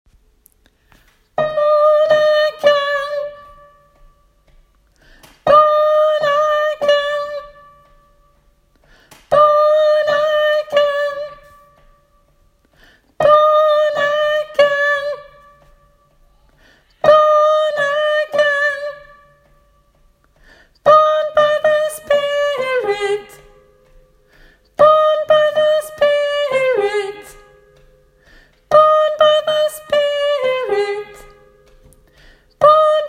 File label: born again avslutning tenor; åfjord